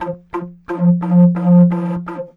moc li, MOCENO BASSFLUTE LICKS; WORLD INSTR 2; WOODWIND AND BRASS

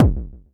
Tech bd, Jomox Airbase99; Drum Machines

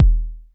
KICK1; Spectrasonics; Liquid Grooves; LOOP BITS

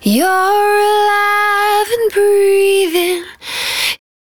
110bpm Ab, Velvet Vocal; Zero-G

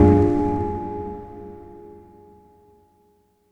Ambient Hit, SFX, One Shots; Sonic Foundry (Sony Creative Software); Electronic Point Blank

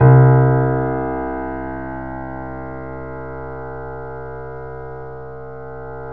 C, 3-D Audio Collection, 3D Pianos, Yamaha C7; E-MU Producer Series Vol. 5

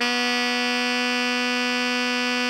ALTO GR B 2, Sax

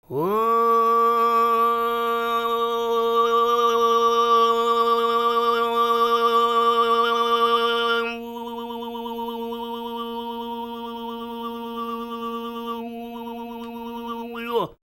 FREE, TUVAN MID

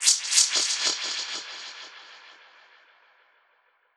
Perc Hit, dub percussion

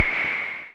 noise fx; Masterbits; Switch FX

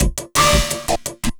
LOOP, Drum & Bass, 170 BPM

